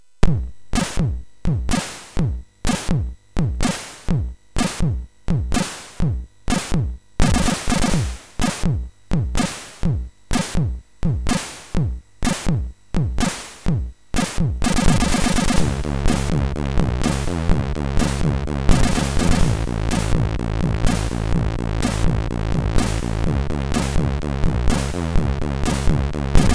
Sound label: Electrobeat, MOS6581R3; Electrobeat 64; Xonic the Fox